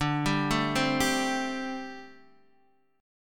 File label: D 7th Suspended 4th, D, down, Guitar Open D